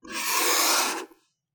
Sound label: slice long; CinemaSpice; Mograph Mini Transitions